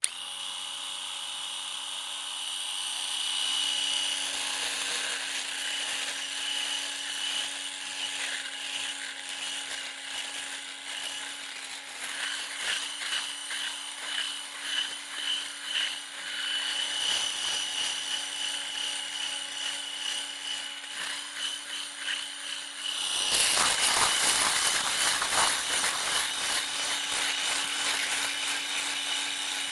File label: shaving electric different positions buzz close; sonniss gdc